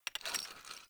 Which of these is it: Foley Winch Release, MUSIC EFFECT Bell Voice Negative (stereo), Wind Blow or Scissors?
Foley Winch Release